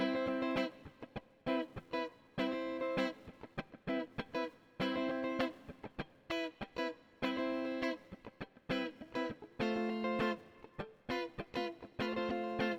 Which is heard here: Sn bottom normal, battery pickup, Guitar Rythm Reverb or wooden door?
Guitar Rythm Reverb